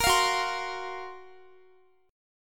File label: F sharp Major Sharp 11th, Guitar; F#M#11 strummed; x,x,22,22,18,20; 4F,4A,5C,5G,5C,6E; chord shape